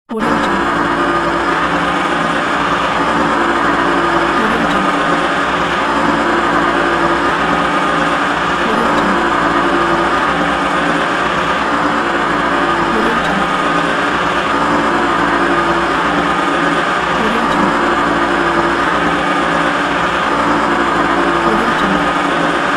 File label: electric generator is running